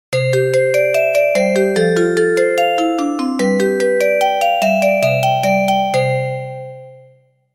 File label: Seoul Metro